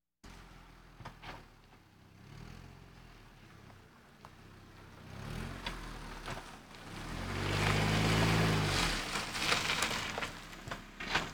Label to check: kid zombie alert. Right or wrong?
Wrong